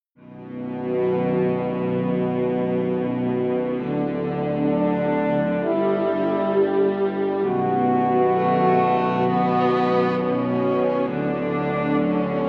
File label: Dark Composition; standard pack 2